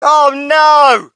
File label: crowd, shout; Mike Tyson Heavyweight Boxing